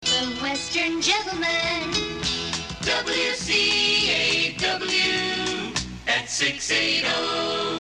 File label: jingle; The Western Gentlemen; 1979 jingles